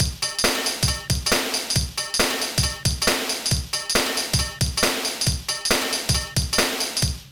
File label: jazzy ORG, Dynamic Drums, Pattern